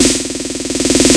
normal hit normal

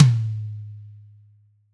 drum hitfinish

